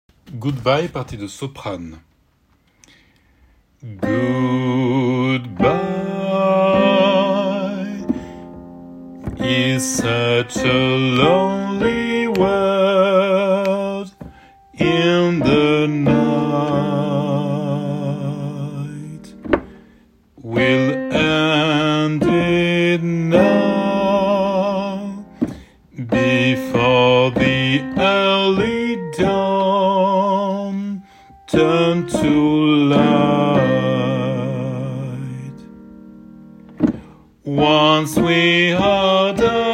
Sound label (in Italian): Soprani 1 et 2